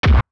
Land Down Thrust

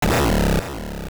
Grab; Hinox grabbing Link (also Ghoma's lunge and Hot Head jumping)

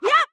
Link Throw; Link throwing an object; The Wind Waker; Zelda

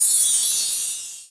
Attack Magic Stars; Zelda

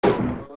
Sign Bounce; zelda; OOT

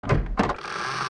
Door Regular Open; zelda; OOT

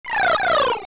Shrink; Link shrinking and growing with the Minish Cap

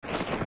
Bongo Bongo Shake; zelda; OOT

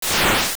Sword Spin; Sword-spin attack; Oracle

